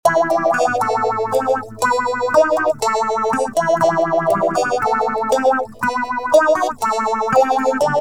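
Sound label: acoustic guitar; nady ps019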